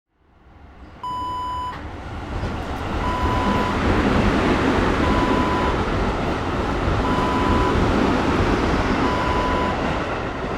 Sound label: metro pass by